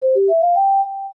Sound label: Silver Rupee; Get a silver rupee; zelda; OOT